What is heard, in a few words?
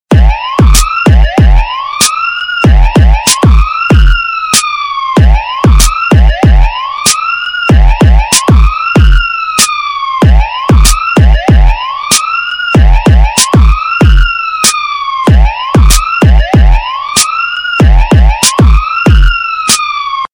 police alarm bass tone